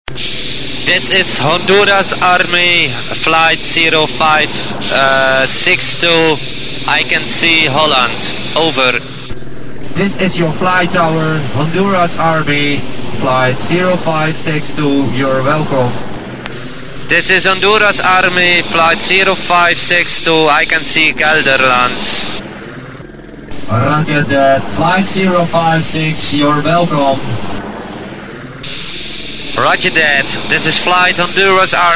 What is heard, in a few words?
Jingle; Audio Fragmenten; Arkan Radio 2